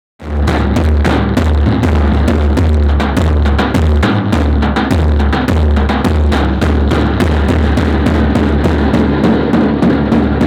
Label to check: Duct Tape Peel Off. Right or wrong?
Wrong